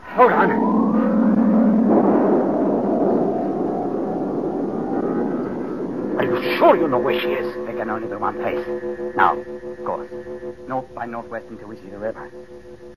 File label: Takeoff; Closed Planet